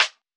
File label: hiphop snare, Effect on